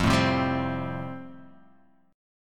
F Major Add 9th; strummed; x,x,3,2,0,2,2,x; JGuitar

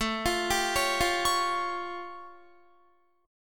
A Augmented 7th A Guitar; A+7 arpeggiated; 17,20,17,18,17,20

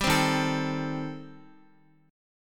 Eb 6th Flat 5th Eb down Guitar; Eb6b5 strummed; JGuitar

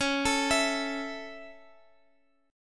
Db Minor Sharp 5th, Guitar, Open G

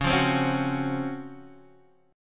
C sharp Major 11th C sharp, Guitar Standard; strummed; chord; x,4,3,5,2,2

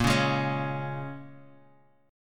A sharp Minor 7th, A sharp, down

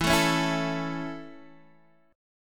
A Major E

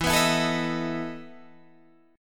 E Major 7th Suspended 4th, Guitar, Open G

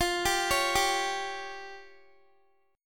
F Suspended 2nd Sharp 5th F down Guitar; Fsus2#5; arpeggiated

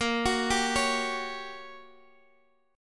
G Minor Major 7th Flat 5th A sharp

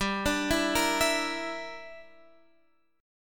G Minor 6th, Guitar Standard; Gm6 arpeggiated; JGuitar

